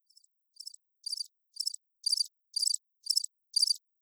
Christtheredeemer Crickets

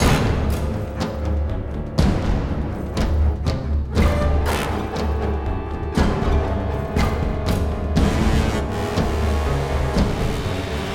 Boss combat; Bosscombat Ch06a 03

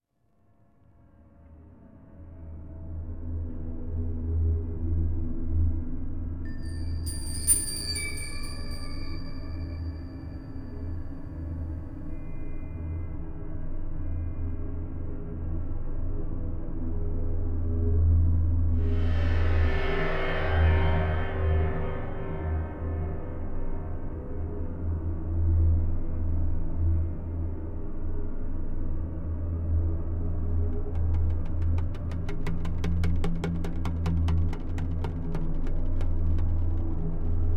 Amb Outdoor; The Hobbit; PS2

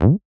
Move

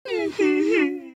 Scared Whimper

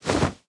Browse Skin; subway surfers city sound effects; Android, iOS

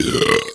Belch; Submarine

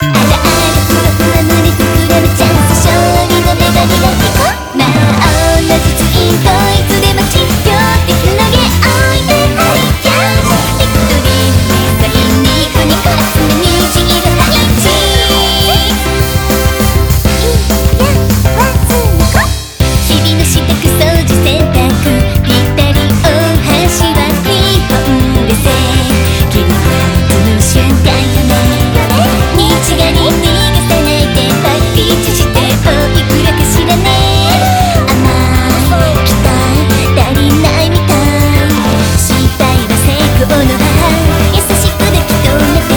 BGM Reach

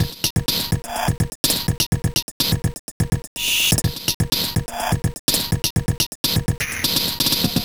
Extra Level Tune

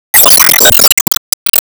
Enemy Road mechanic Active Loop

